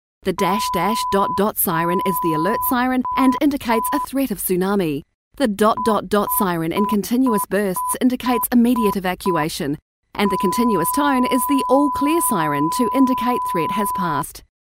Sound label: tsunami warning signals